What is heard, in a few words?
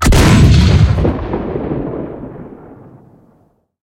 cannon 128mm pak44 shot, cannons tanks; War Thunder